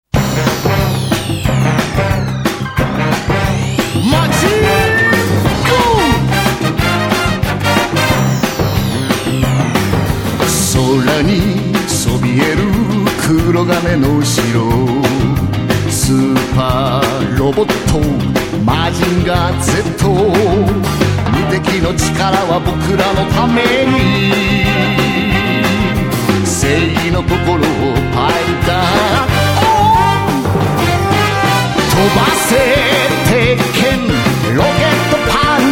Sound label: Vocal Track; SRW